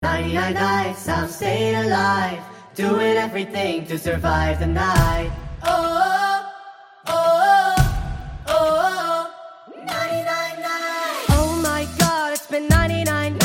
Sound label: sped up horror